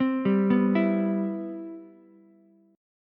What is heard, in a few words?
C Major 7th, C, down, Ukulele; CM7 arpeggiated; JGuitar